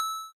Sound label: correct